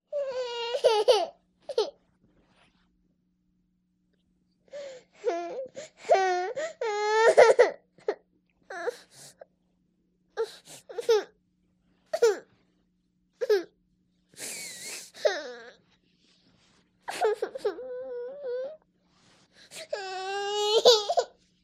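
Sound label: crying of two girls aged 4 and 3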